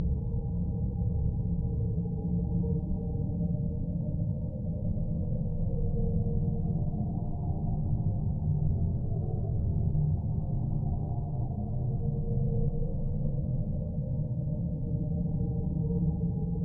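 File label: Ambient Tomb Loop; Silent Hill Homecoming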